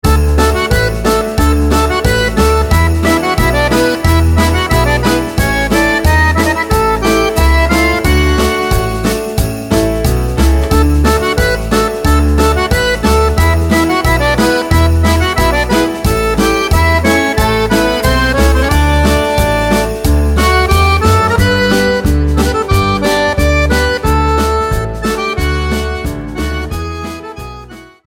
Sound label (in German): Weinachten Musette